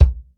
natural kick drum sound c key, kick tuned to the C note